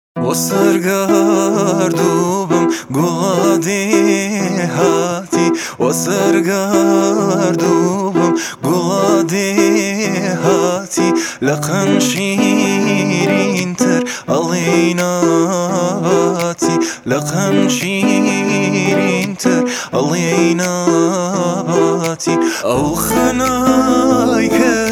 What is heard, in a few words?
guitar